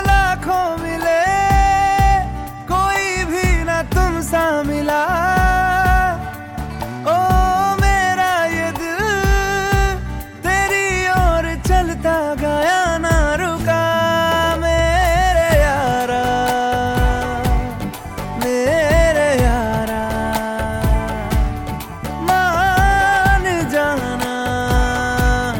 hindi song ringtone, bollywood

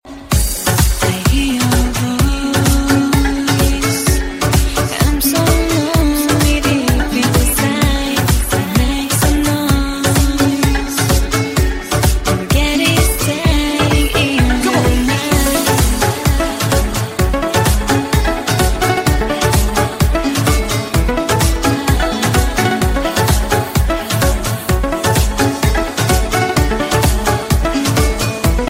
club dance ringtone

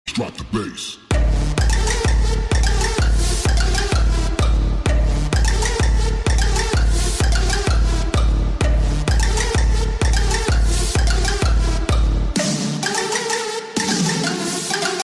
drop the bass

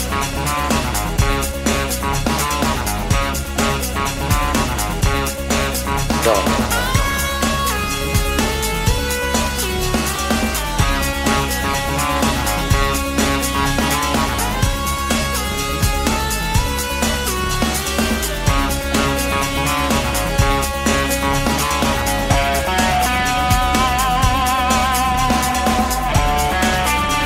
mix tamil music ringtone

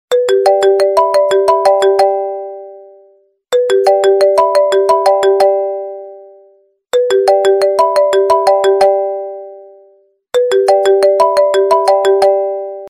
iphone sms ringtone

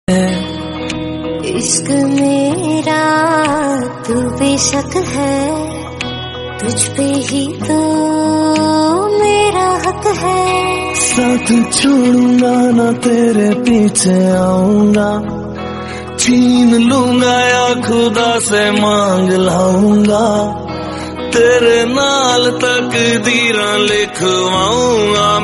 romantic hindi bollywood songs ringtone

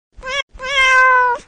cute cat meow message tone ringtone

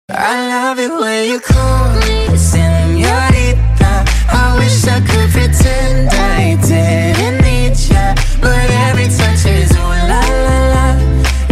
sweet romantic ringtone; tamil

